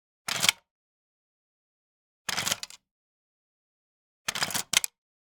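Gun Mechanics, Saiga 12 Cal.12 SOURCE Chamber Eject Bullet; MKH416; Dramatic Cat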